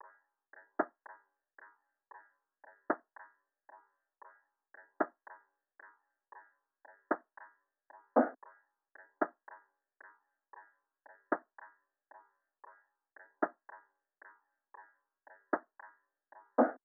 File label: Drum Loop, 114 BPM Percussion; Loop Stems; Cymatics; Vibes II Drum Loop Collection; Prize